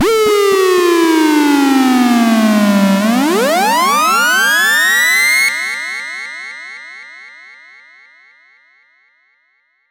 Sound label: siren